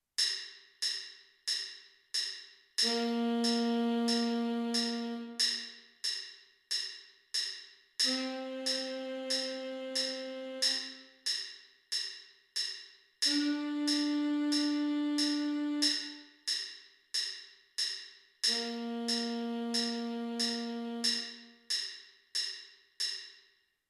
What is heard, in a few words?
band on parade tenor sax